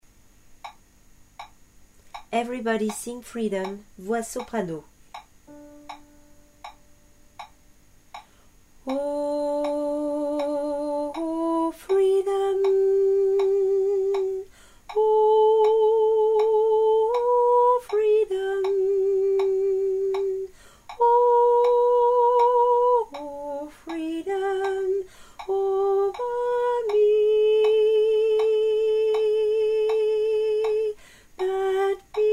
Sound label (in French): soprano